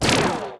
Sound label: Fire Red Laser; SWARM